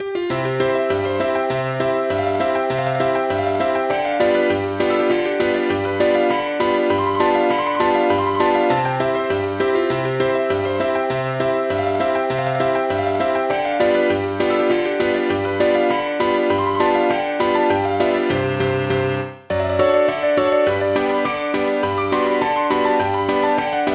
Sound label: Clarinet Polka